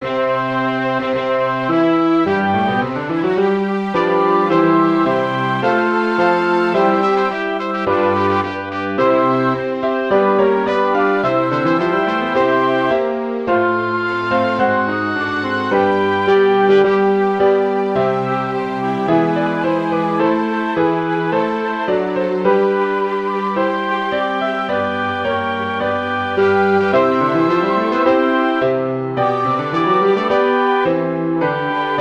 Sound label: auferstehungslied for winds strings piano; fuhrer robert